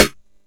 arrow hit; Character Actions

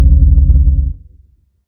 abyss ambient; Sfx; freepixel; Tilter

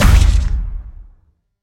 critical hit; WordJourney

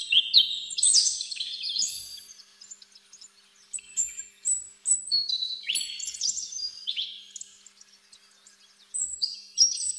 forest ambience; Ambient; ambient loops

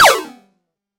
grapple hook; Movement

